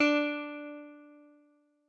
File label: D Elec Guitar; Notes; Scratch